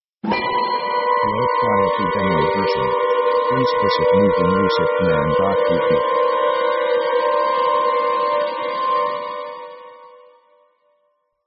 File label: suspense hit; power themes